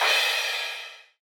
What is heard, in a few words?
crush